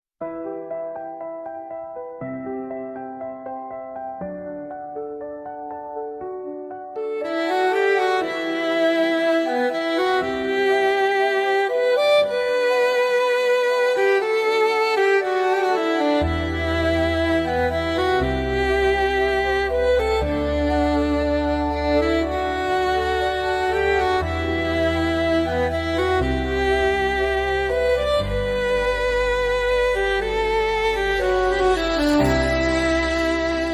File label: Celtic Violin